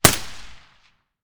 ump45 silencer mono distant

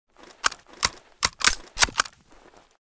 mosin misfire, weapons

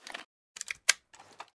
rg6 holster

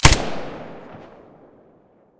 silencer stereo, weapons; vpo101

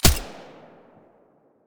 mdr silencer stereo, weapons

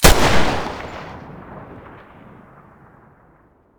muzzle deagle mono, weapons

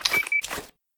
quickhide, detectors; veles; STALKER; IWP

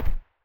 sa58 silencer mono far

